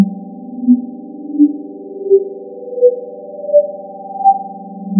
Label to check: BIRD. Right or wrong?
Wrong